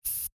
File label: Deodorant Spray Short; household